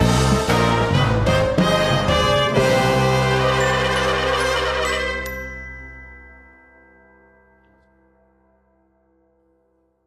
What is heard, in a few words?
Crimewave Complete Sting; lego the incredibles 2018 pc